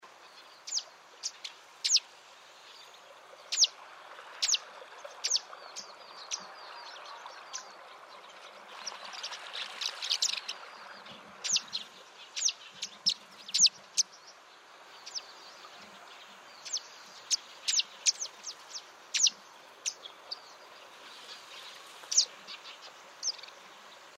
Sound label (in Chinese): 棕沙燕; 褐喉沙燕; 燕科/留鳥